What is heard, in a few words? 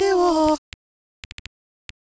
Processed Vocals, Loops